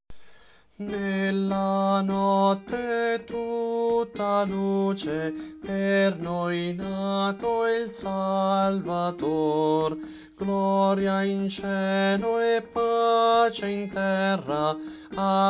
soprani, Coro; Coro Isola del Giglio

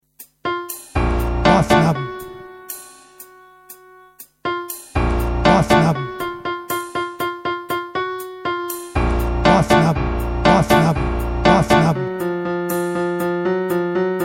bassi; Carmina

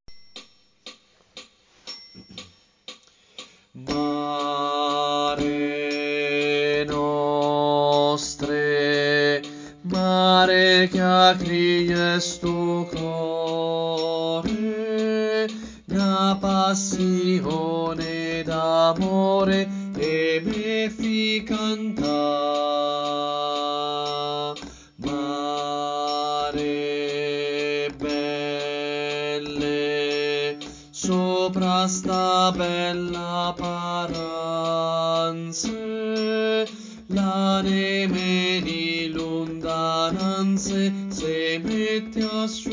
Bassi; Coro Isola del Giglio; Mare nostre

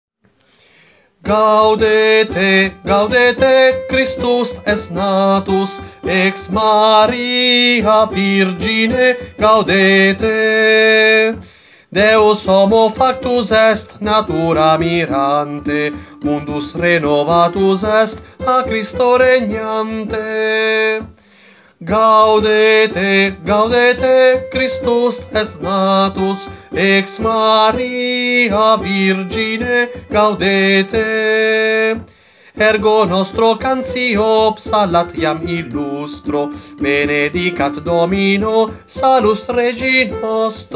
soprani, coro; Coro Isola del Giglio; Gaudete

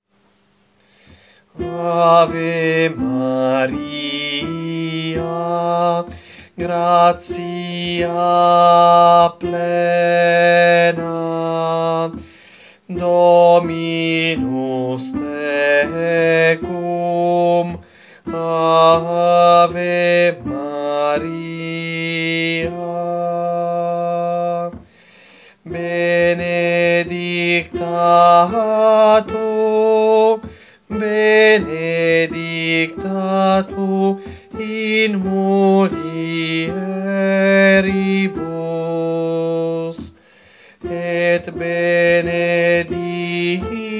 Contralti; Coro Isola del Giglio; Ave Maria Arcadelt

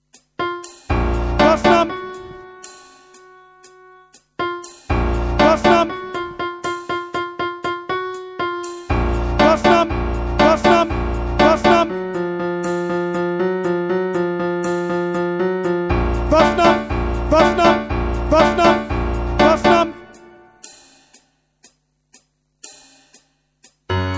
tenori; Carmina

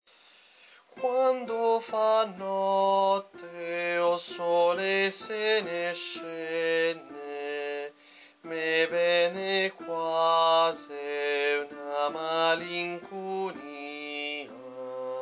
O sole mio contralti

